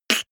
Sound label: beatbox snare harsh damped